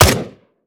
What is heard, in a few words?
akilo47 smg; weapons; viper